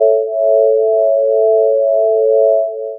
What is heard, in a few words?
triad